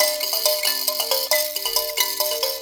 VIB KALIMP, VIB KALIM; Spectrasonics; Heart of Africa 2

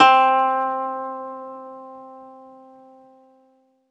ZITHER C, Alpine Volksmusik

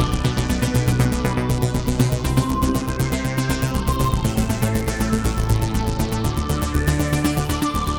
Arp, Keyboards

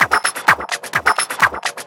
FX Loop 128 BPM, House

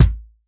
kick lofi

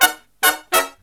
Brass Riffs; USB Soundscan vol.29; AKAI

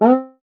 Cuica Low, Percussion; Roland BK-5

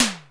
TOM, Simmons SD-8 Drums

